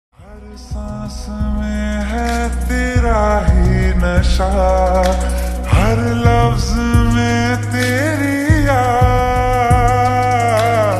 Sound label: Slowed Reverb Ringtone